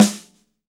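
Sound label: SD, snare drum; 90 s Sample CDs; Sound & Vision - Gigapack I CD 1 (Roland); Real-Kit m 7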